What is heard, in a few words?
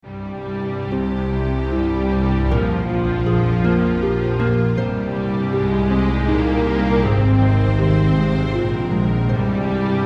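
background music without words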